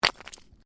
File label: Ice: small chunk of ice lands on hard surface; Snow Sound Effects; Stuart Duffield